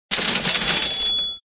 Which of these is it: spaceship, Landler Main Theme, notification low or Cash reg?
Cash reg